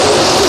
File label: Sfx Winter games